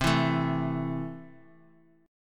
C 6th C down, chord

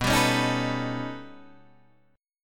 B Augmented 9th B down Guitar Open D

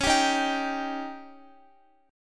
Db Suspended 2nd Flat 5th, Guitar; strummed

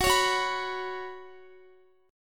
Gb Suspended 4th Gb, down, Guitar; Gbsus4 strummed; 4E,4A,5D,5G,5C,6F

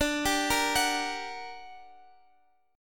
G Minor Major 7th, D, guitar chord

